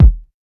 phat kick sound e key, Royality free bass drum tuned to the E note; Loudest frequency: 101Hz